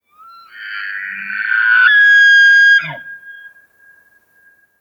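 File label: Wapiti Elk Call Single; UltimateFishing2020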